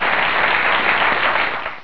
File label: level finish; pathological